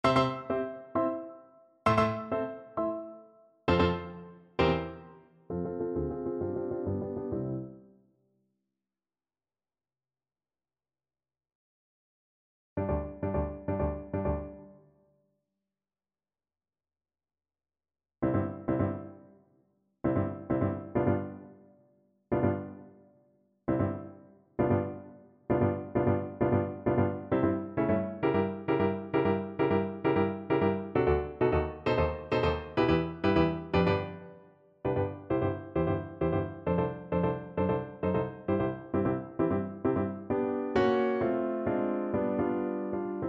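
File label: Idee fixe for Violin; Berlioz, Hector; Symphonie Fantastique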